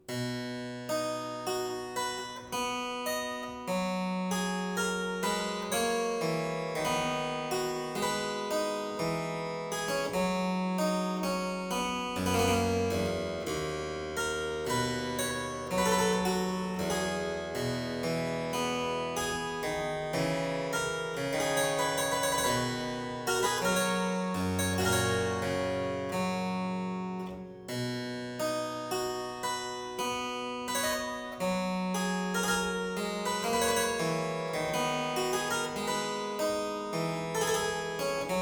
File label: Suite in C major Sarabande I, Harpsichord; Buxtehude; Complete Harpsichord Music; BuxWV226